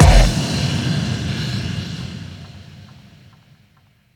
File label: bassdrum reverb FX kick; Vengeance Samples